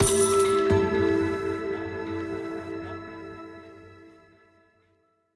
Ui Menu; Archeland

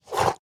Growl

